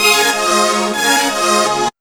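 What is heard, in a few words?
string riff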